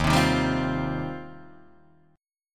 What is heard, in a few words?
D sharp 6th Add 9th, Guitar, Open D